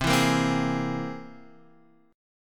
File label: C Augmented 7th, Guitar, Half Step Down